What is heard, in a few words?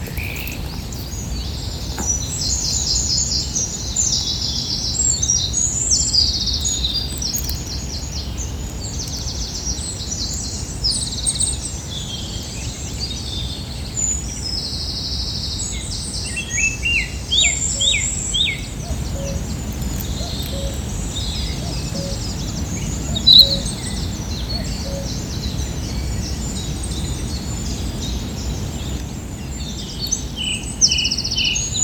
MORNING CHORUS